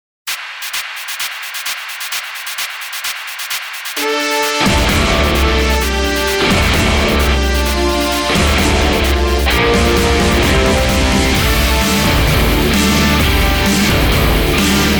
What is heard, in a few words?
Battle Rock